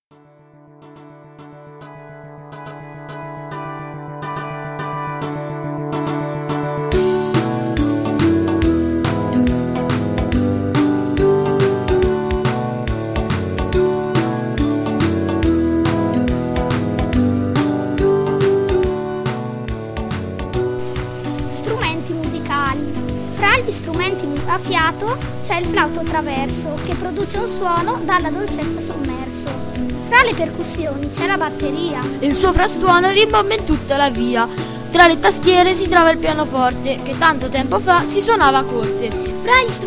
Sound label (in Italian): strumenti musicali